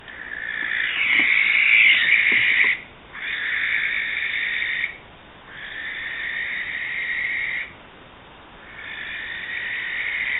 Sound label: Barn Owl Screech